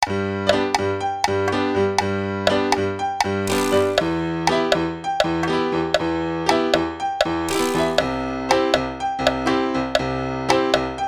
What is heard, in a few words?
accompany slow; 慢版